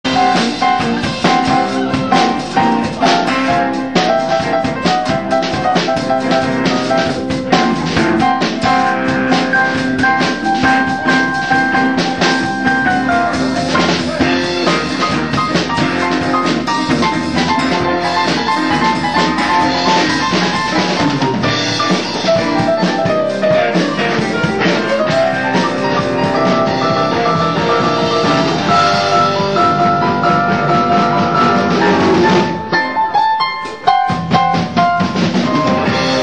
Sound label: Chorus Piano